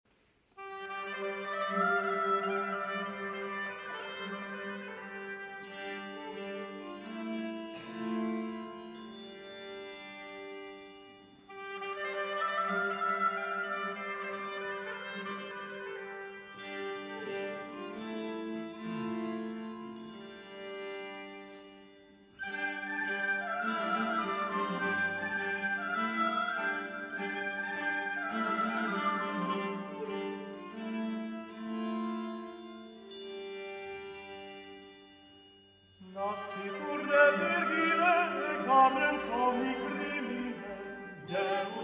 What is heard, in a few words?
hled male jezulatko; Nascitur de virgine